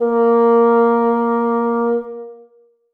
bassoon a#3; Sonatina Symphonic Orchestra

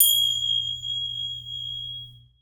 finger cymbal hi, percussion; Sonatina Symphonic Orchestra

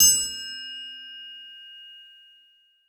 triangle opn; Percussion; Sonatina Symphonic Orchestra

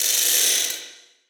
ratchet, percussion; Sonatina Symphonic Orchestra

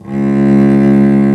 Solo Cello C#2; Strings

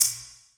shaker hi b; Percussion; Sonatina Symphonic Orchestra

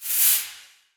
cabasa, percussion; Sonatina Symphonic Orchestra

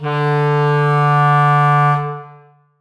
clarinet d3; Sonatina Symphonic Orchestra

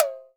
TOM M; akai; mpc1000; factorypresetsamples

